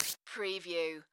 Hand Wipe Single

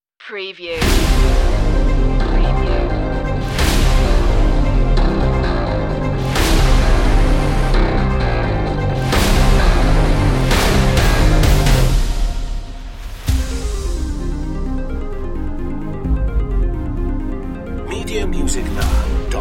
outbreak no choir

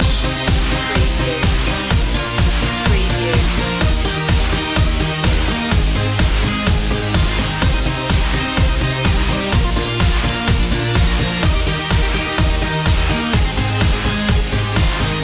arena synth loop

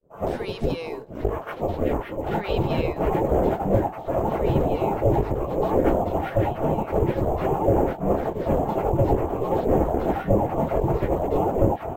Alien Radio Trans; sound effects bible

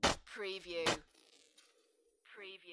Sauce Bottle Empty; beanstalk audio

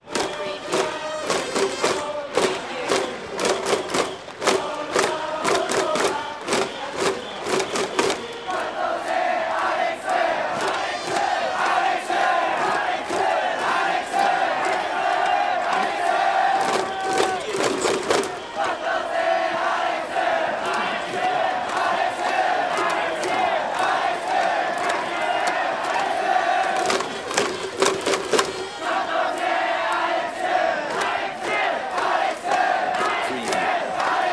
Hiroshima baseball crowd chant Japan; Hiroshima Carp

